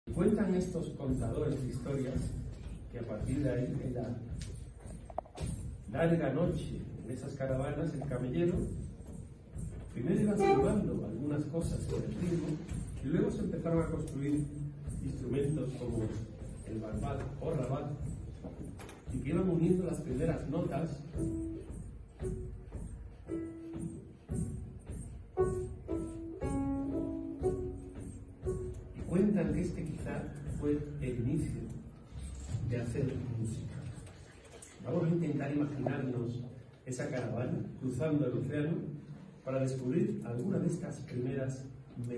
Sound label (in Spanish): Música Medieval-Antigua; Actuación